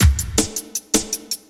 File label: LOOP, Drum & Bass, 160 BPM